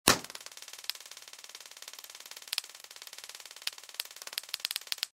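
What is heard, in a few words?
pistol stun gun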